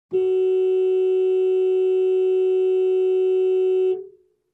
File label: Car honking outside